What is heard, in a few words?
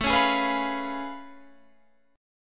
A Augmented Major 9th A down Guitar Standard; strummed